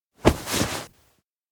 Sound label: bed jump wake; anemoi